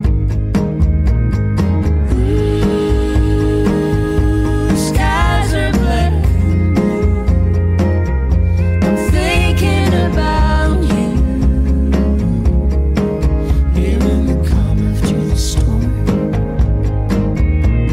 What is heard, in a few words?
klingelton